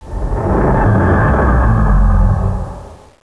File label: cloak disengaged; armada2